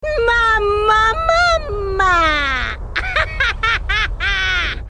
laugh; charlotte linlin big mom one piece

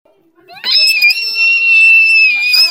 screeching

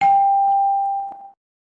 ding; family feud

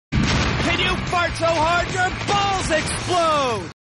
fart

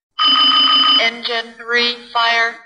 engine fire; engine 3

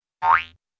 buildup boing

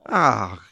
aughhh, noise of disgust

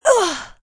hit

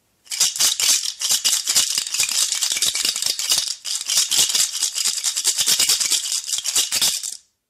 maracas sound

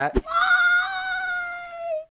screaming; ice cube

